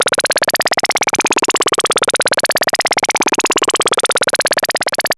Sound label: OSCILLATOR